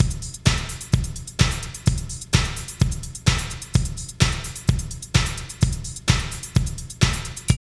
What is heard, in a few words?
LOOP, Tekno Industrial; East West